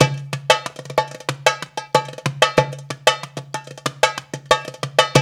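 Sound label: 93 bpm, Darabuka Bell; Spectrasonics - Supreme Beats - World Dance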